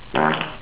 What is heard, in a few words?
fart; Quark RB; nerd